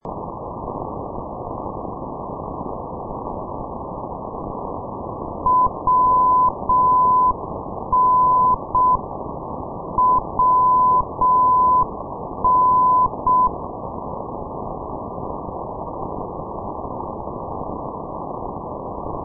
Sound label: ndb minsk machulishchi; wn-458; sdr kiwi minsk; europe